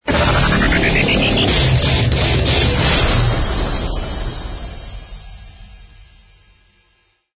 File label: levelup; knife